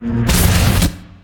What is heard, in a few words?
Boss Shockboom